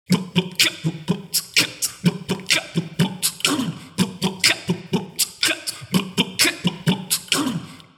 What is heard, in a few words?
beatbox 16 beats; guard2 jungle